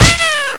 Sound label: Ostrich D; fun and bullets